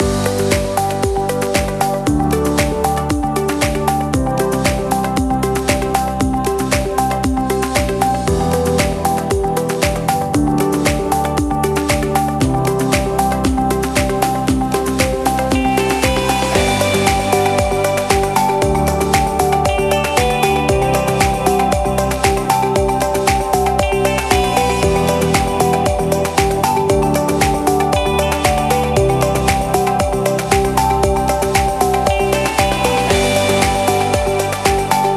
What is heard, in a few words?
Creative Background Loop